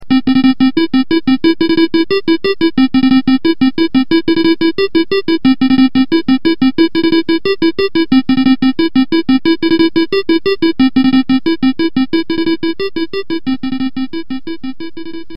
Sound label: hammer; mariobros